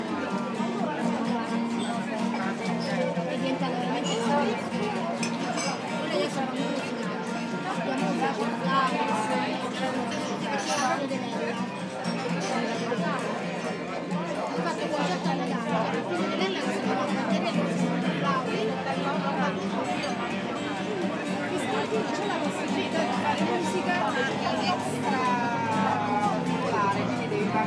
cafe

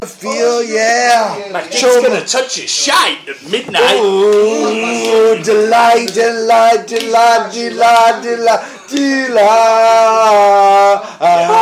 pub singalong

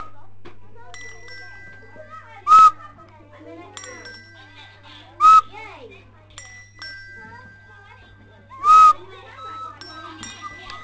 music

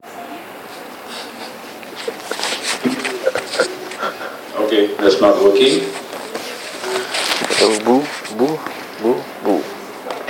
boooo

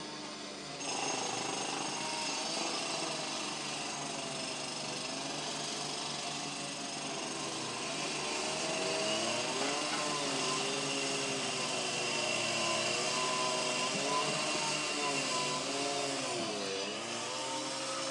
drilling

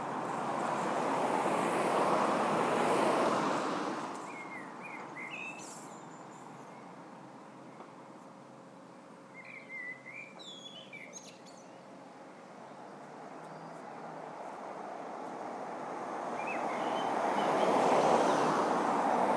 beautiful bus stop blackbird

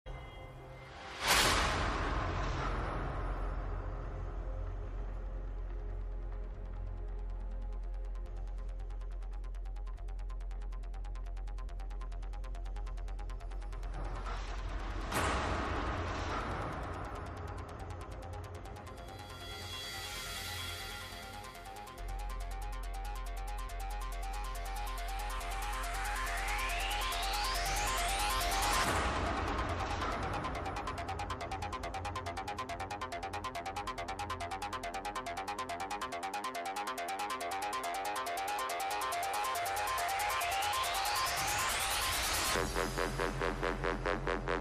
noise dubstep madness

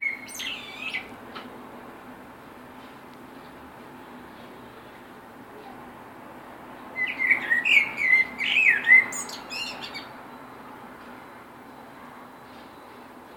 blackbird on tree right outside flat

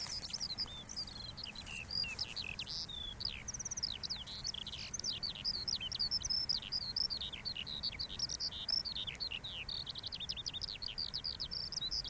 skylark at godrevy

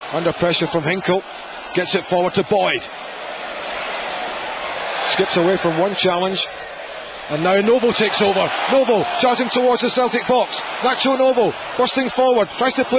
yaaaaaaasssssss

